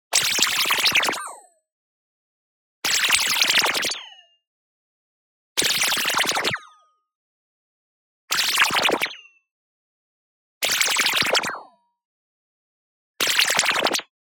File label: movement fast, frequency filtered liquid; boom library; Anime Essentials; DSGNMisc